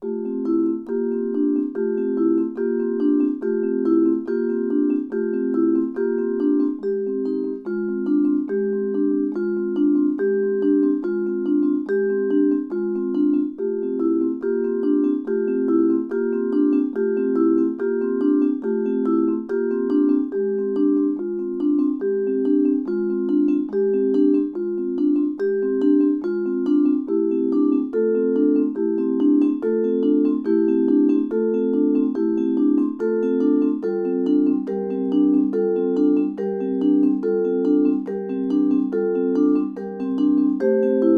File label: Vibes, 71 bpm